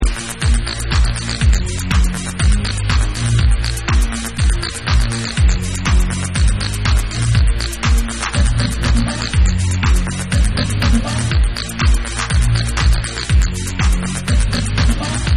BEAT

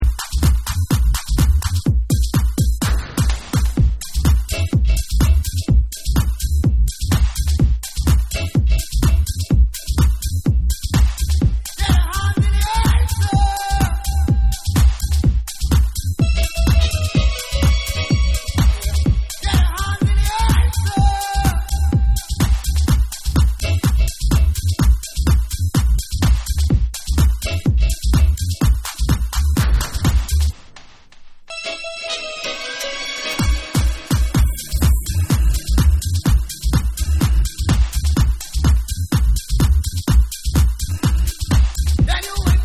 Dub

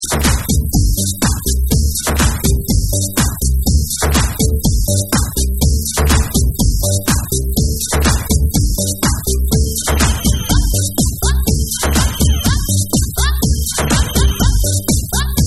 drums

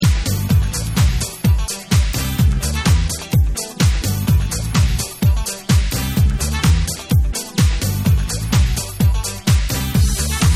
Guitar Dub

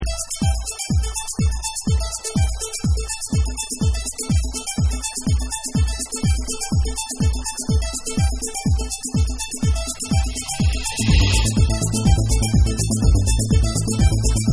Ambient Mix

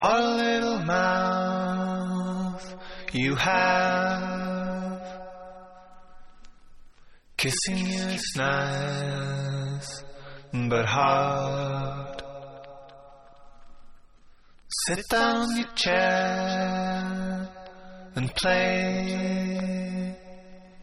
Acapella

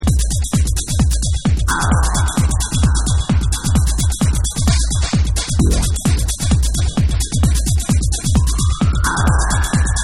Hard Drum

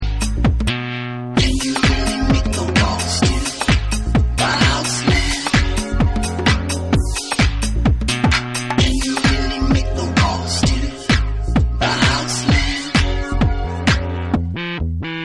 HOUSE

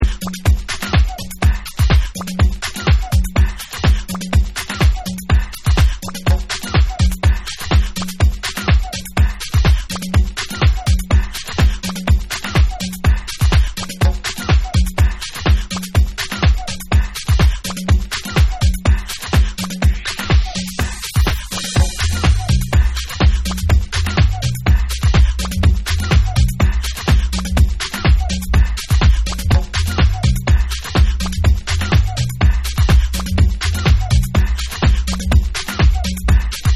Drums

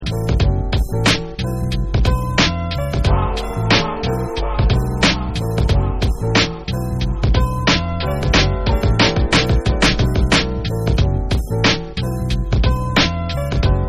Instrumental

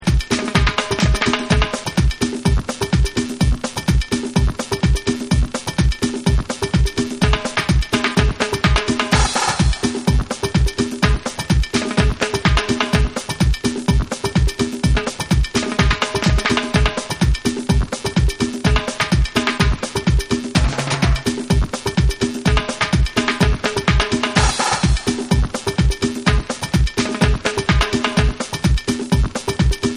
Beats

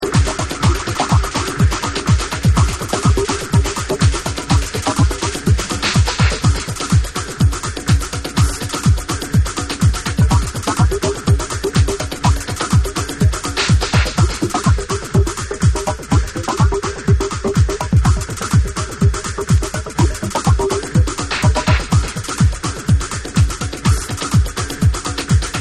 Unreleased Drum

